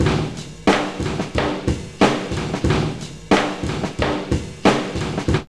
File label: JAZZ LOOPS, 91; Zero-G; Funky Elements